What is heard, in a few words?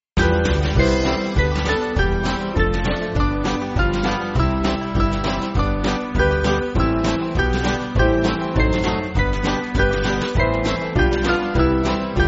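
Band, Backing Files for Churches; Ye Faithful Souls; Festus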